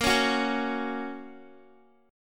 Bb Minor Sharp 5th Bb down Guitar; Bbm#5 strummed

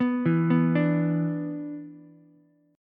B-Minor Double Flat 5th, Ukulele; Bmbb5 arpeggiated; JGuitar

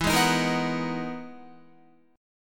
E-Major Sharp 11th E, Guitar; EM#11 strummed; JGuitar

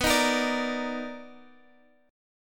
B Major 7th Sharp 9th B; Bmaj7#9 strummed; JGuitar

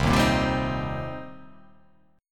C Minor 7th C down

